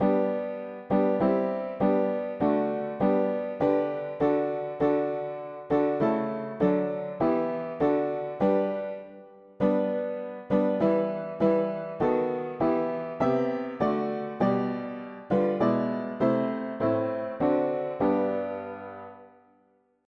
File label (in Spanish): mono piano; hymns; Himnario pequeno